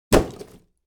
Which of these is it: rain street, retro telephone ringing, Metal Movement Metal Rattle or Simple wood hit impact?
Simple wood hit impact